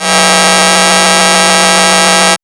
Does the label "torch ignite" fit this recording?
No